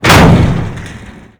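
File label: debris metal medium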